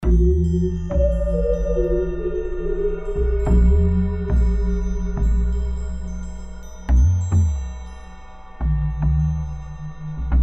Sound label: music creepy dungeon; dragon fin soup